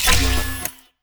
grabbity acquire; player

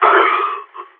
death; Counter Strike; CS:GO; phoenix

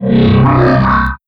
vox f dry, strider; creatures; Half Life Alyx

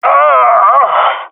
death, jungle male; vo; agents; Source Model Packs; Counter Strike Model Pack; CS2; jungle male epic

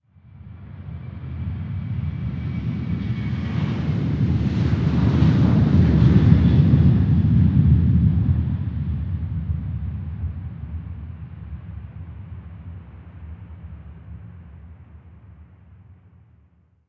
airplane, ambient; nuke

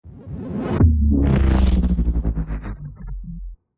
deathcam; music

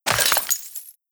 debris pottery, bullet impact debris

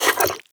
breath in, headcrab black; creatures

